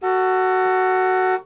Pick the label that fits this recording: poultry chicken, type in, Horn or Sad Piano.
Horn